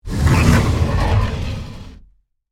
Secret Passage Way, Horror, Spin, Wood, Door, Open; Scary Soundscape; BlastWave